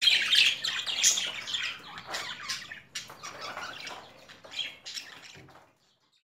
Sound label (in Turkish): kuşunun sesi